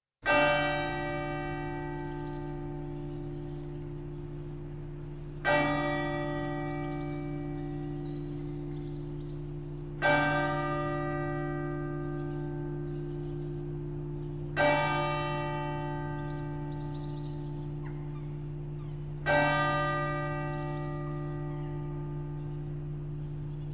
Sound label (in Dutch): klokken